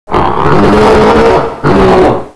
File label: beat chest roar, gorilla; valve